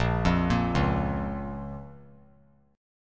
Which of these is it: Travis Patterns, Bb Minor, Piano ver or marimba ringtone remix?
Bb Minor